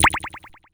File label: GUI Select